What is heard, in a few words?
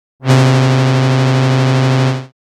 synthlead; Wide